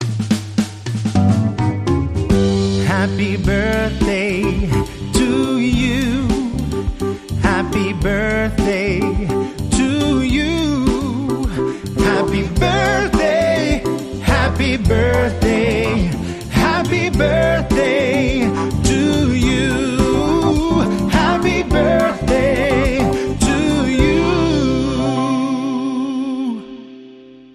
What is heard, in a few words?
Soul Male Vocal Happy Birthday